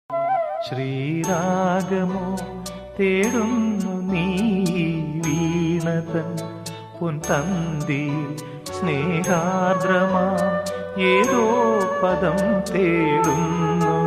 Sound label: melody, malayalam music